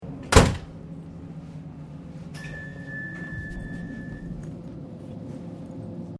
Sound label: Train Melbourne Interior Door Alarm; Public Train in Melbourne; Trains; Vehicles